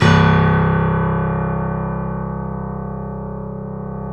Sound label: KEY, Steinway ff; Steinway M